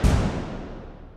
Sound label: Tymp